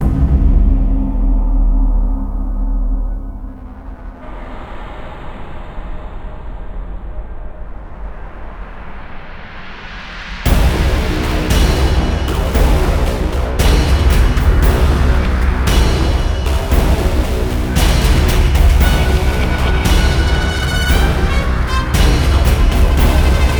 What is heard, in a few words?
tank intro, music; Quake 4 Alpha